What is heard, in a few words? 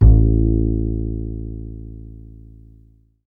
upright (A), bass; Sonic Foundry (Sony Creative Software); Ugly Remnants VOL-2; Basstuff